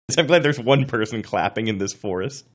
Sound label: one person clapping in forest; glad